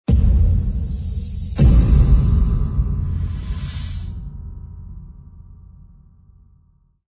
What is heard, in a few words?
Spiral Particles Logo SFX; Logo Only